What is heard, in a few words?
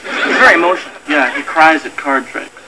cries; Missing